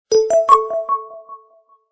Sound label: tecno camon 19 notification